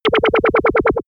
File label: synthetic wobble; bewegung; comedy-cartoon; soundpool